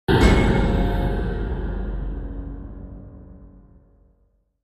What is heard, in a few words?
Impact HF Metallic Cl